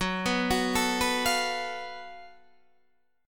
Gb Minor Major 7th Double Flat 5th, Guitar, DADGAD, chord